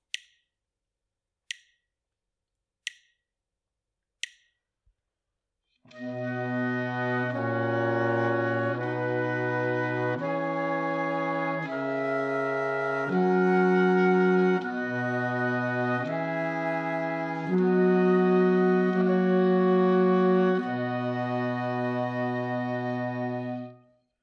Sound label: harmonic error detection